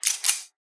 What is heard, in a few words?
gun cocking slide; soundscrate